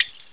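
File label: GLASS PING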